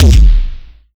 DUTCH KICK; Masterbits; Soniq Elements

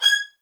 MED ATT G# 3, soft violins; Q Up Arts; Denny Jaeger Master Violin Library

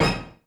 Korg 01W Dance Kit; 300 Drum Machines

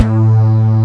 DIGITAL BASS, BassGuitar

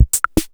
Chaser PR-80

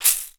SHAKER, AFRICAN SET, Percussion; Voice Crystal - Steve Reid Definitive Percussion

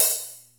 RY30 OPHH; Hollywood Edge; The Big Bad Beatmachine

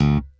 D1 SHORT, D-STIK BASS; D-STIK BASS2

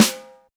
SD BONUS, Drums; Best Service; Real Mega Drums VOL-1

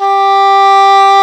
English horn G3; InVision Interactive; Lightware VOL-1; Instruments & Percussions

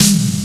Lutefisk E Snare, drum machine

